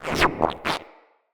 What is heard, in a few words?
Mine Osprey Attack; Characters; Corpus